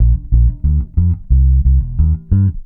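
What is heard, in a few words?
reggae bass #A; Best Service Advanced Media Trax Modern Composer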